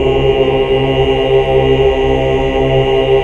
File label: HAL OOH OOOH Oct; Sound & Vision - Gigapack II CD 1 (Roland)